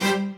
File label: strings